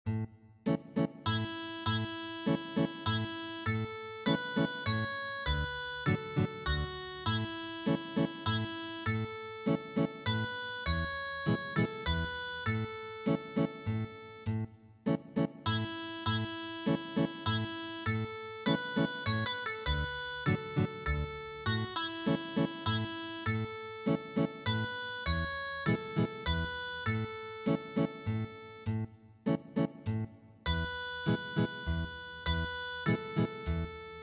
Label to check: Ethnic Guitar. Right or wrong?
Right